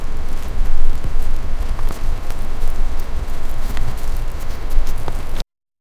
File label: soft footsteps on gravel